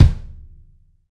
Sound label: Pop Kick; Roland BK-5